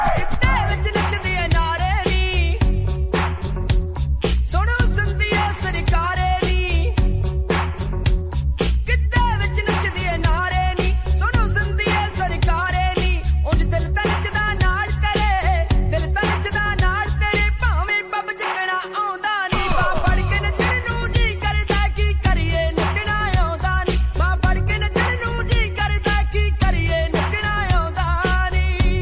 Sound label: cool hindi song ringtone